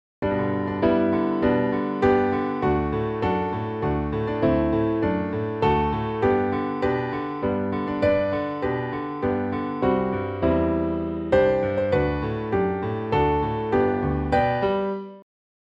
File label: Happy Birthday G Major alberti bass